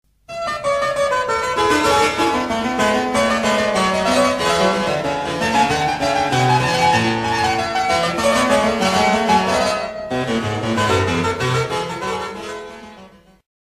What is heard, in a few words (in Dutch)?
Fragment klavecimbel